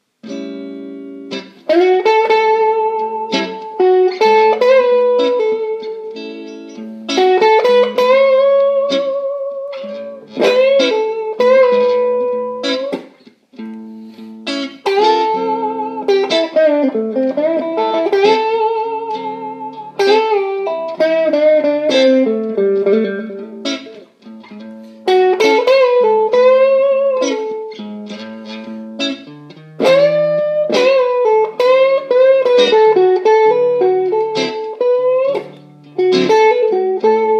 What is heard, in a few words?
major minor penta, blues guitar